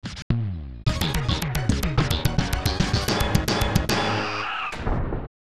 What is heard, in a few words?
4th Place Finish; Exhaust Heat; SNES